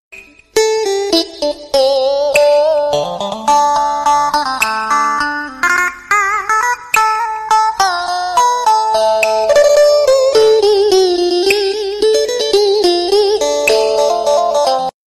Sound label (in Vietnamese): Nhac Chuong Beat